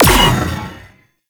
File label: Space Fighter Fire; Warframe; RailJack; Enemy Ships; Sentient; Coil Agent